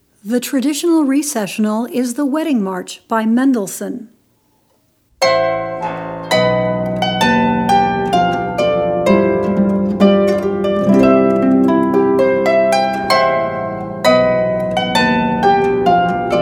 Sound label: harp